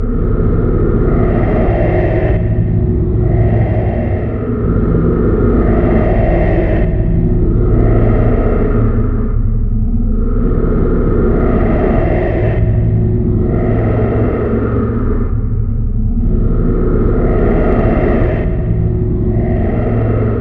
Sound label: other breath; svencoop